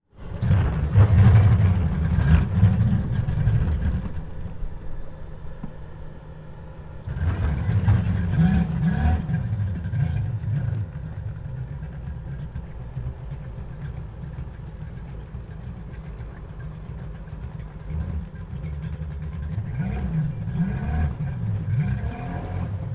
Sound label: Vehicle Boat Engine Starting And Idling; transportation